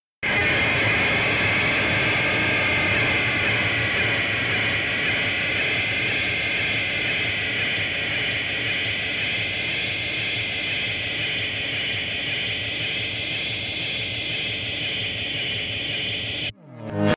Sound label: noise